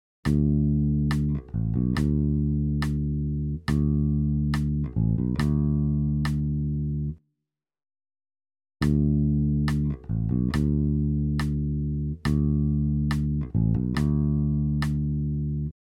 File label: Pattern, Bass; Bass Unlimited